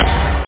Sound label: bassdrum race, drumkit, bassdrums; OctaMED SoundStudio V1